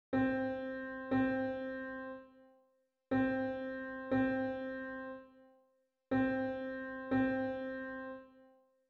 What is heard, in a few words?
Unison, Intervals; Plainview-Old Bethpage Central School District